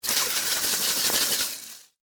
Pesticide Can Shake, Extermination, Pesticide, Can, Shake, Insect, Bug; Animals:Insects